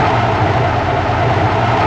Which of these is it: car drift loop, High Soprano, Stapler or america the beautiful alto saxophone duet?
car drift loop